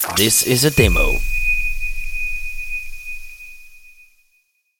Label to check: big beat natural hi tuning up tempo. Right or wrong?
Wrong